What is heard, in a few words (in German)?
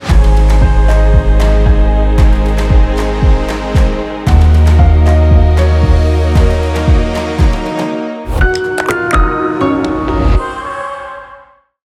Musik; Mein Schiff; Brandmusic